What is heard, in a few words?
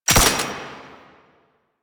Belt Fed Rifle Low Clip; Weapons; Warframe; Tenno; Frumentarius